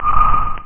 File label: pling